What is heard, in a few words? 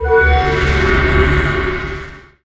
Mana Burn; Gamigo